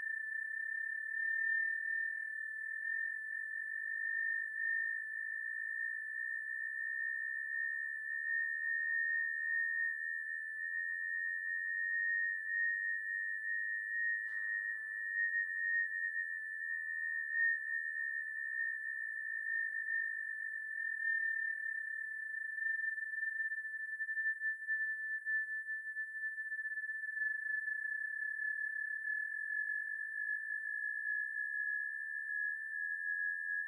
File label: Drone Calm